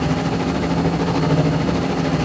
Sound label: gunship steady; vehicles; sith